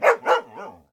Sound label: panic, dog